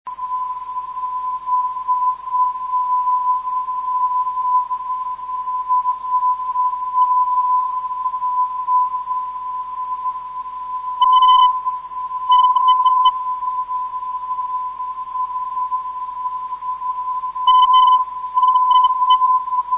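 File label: vi 334khz, non-directional beacon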